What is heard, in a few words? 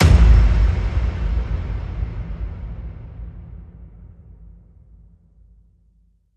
Hit Low Wallop